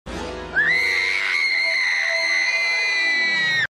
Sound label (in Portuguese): billy gritando